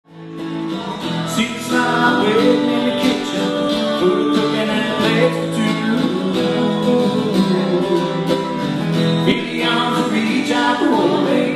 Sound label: acoustic duo